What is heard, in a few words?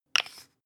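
toothpaste close twist hard, Bathroom; haus-garten; badezimmer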